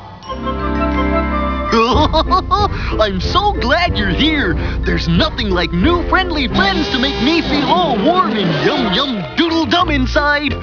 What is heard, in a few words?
Yum Yum; AnimanBaloney